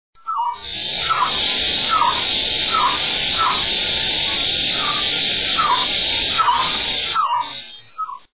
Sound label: tikal quetzal; guatemala